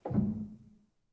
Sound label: rel, Grand